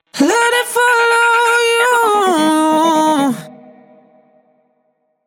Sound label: trance, voice; OVox人声Trance门限效果; Waves